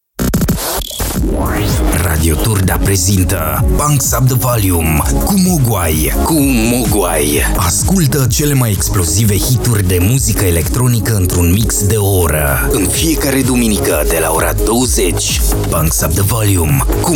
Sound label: Jingle